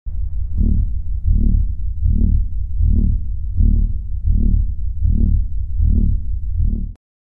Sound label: Body Scan Heavy Synt